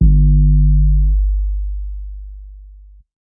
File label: Less 808 long; drums single hits; Khezie 808s